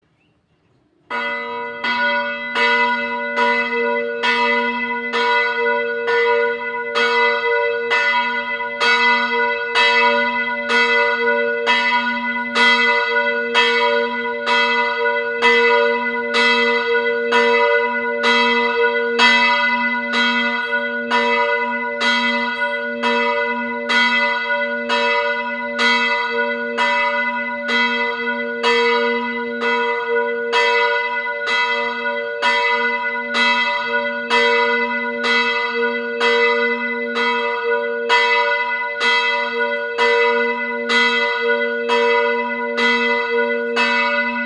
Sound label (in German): Glocke, Reformierte Pfarrkirche; St. Blasius Ziefen; die glocken